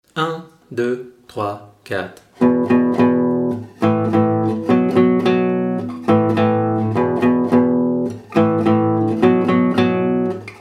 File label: Little Riff, guitare classique